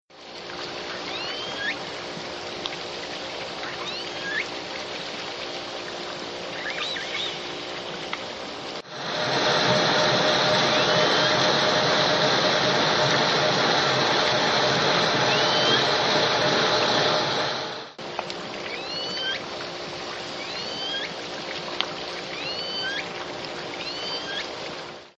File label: L pod ship noise, SRKW calls and vessel noise